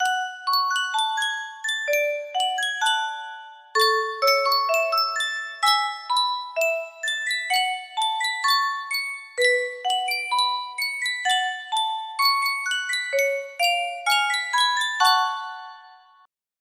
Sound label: Yunsheng music box, music box melody